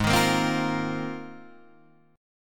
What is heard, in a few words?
G sharp 6th Add 9th, Guitar, Open D; 6,6,8,6,8,8; down; chord shape